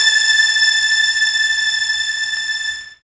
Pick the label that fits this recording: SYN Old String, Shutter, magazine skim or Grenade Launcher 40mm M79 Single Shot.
SYN Old String